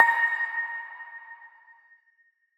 tonal blip; soundscrate

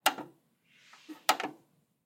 VHS buttons; SoundsCrate

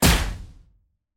Gun Shot; SoundsCrate

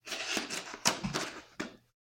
package tearing; soundscrate

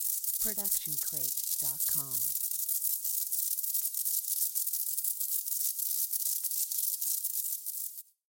fuse

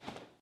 Sheet in Wind

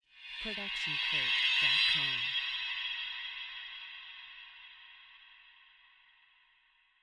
Reverse Magic Shimmer; SoundsCrate

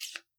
scissors; soundscrate

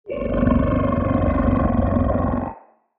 t rex growl; soundscrate

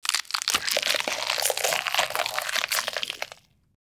body gore

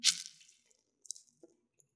blood squish

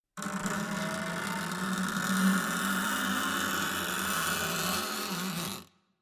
horror scrape

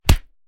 Fight Impact; SoundsCrate

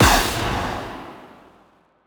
Sci-Fi Gun Shot; SoundsCrate

